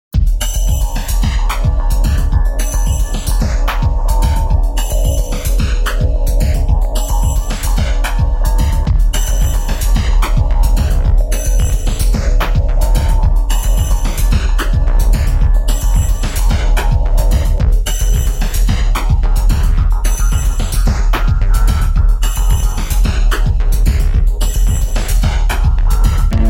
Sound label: bassline